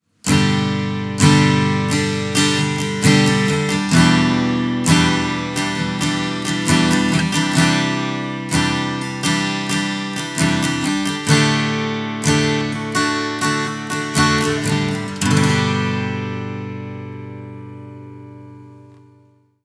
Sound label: STRUM